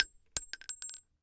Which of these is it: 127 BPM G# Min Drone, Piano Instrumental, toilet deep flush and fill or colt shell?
colt shell